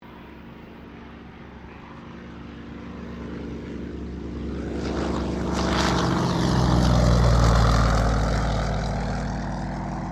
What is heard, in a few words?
1977 YAKOVLEV YAK-52 PAIR PASS, Russian Yak 52 Training aircraft; Airplanes; Vehicles